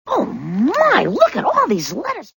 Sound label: Mail